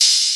open hats

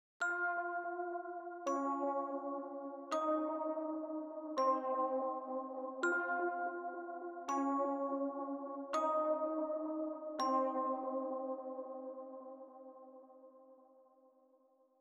165BPM Omnisphere

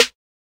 Open Snares

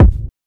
Kick

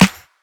Snares

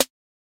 Open Snares

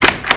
Perc

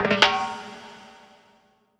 timbale fill; Percs

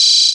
Open Hat

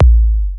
Kicks

